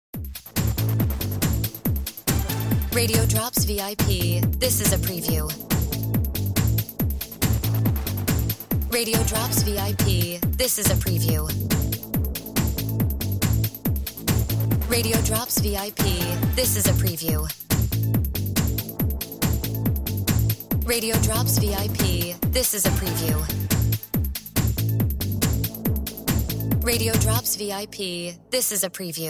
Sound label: No FX Music Bed